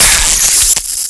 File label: player electrocute